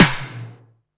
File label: SNARE, Techno 138 BPM